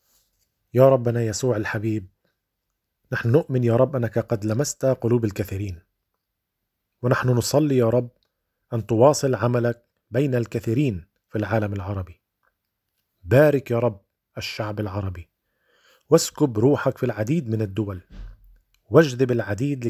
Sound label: prayer concluding hymns